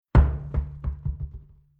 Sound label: Mechanical Large Tire Dropped On Ground Dull Thud Bounces